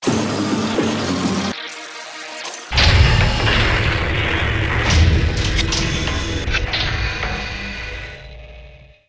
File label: door